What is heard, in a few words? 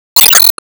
Enemy Pirate Minion Active